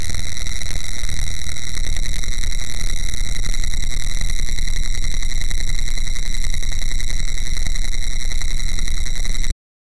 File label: a high fidelity cockpit; aviation